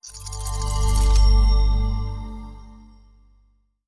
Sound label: skip charged